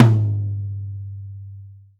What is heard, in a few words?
Tom Hardest; hydrogen drum samples